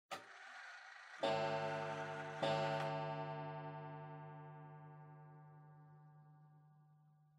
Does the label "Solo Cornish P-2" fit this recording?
No